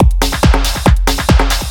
TECHNO BEATS 140

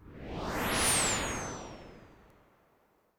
FX Industrial 140

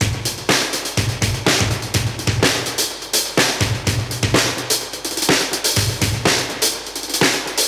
125 Classic Breaks, 125

